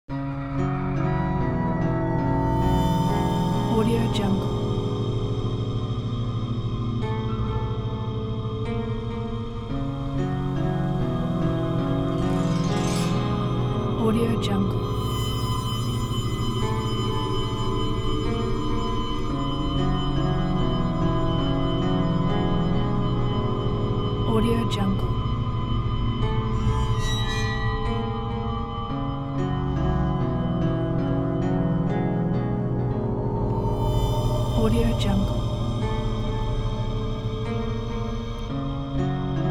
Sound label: dark place haunted mystery